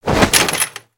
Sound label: cloth short; creatures organic